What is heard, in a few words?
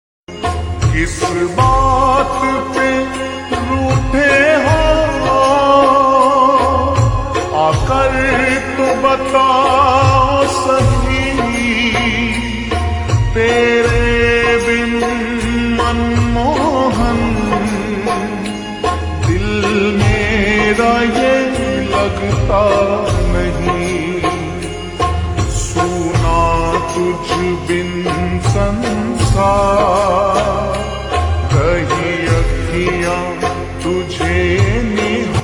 Slow Reverb Version Ringtone